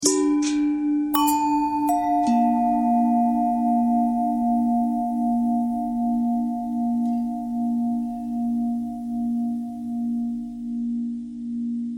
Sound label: long bell thread noise; bells